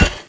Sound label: knife hit wall; weapons